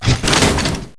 drop strong Box

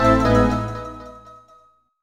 Jingle Negative; Virtual Villagers: A New Home; NDS

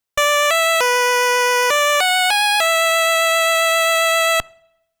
Reed Flute

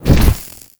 fireball impact burn; goddess of trampling

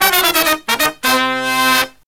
Lose; Katekyoo Hitman Reborn Dream Hyper Battle; wii

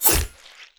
Knife Stab

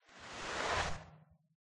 Arm Slide

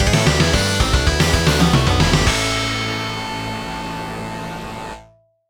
Gameover; super xyx; windows; Epilis Gameover Muz

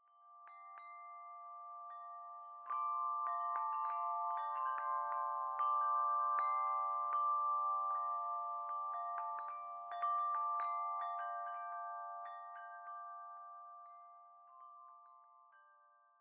Windchimes; macos mobile windows; Plant Tycoon

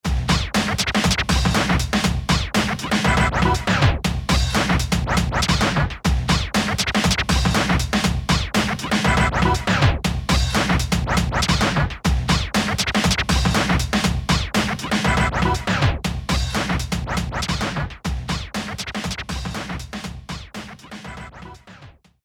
Scratching; Luigi's Mansion 3

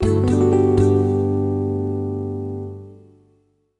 Correct; mind. body. soul. blend it 2010 nds gamerip

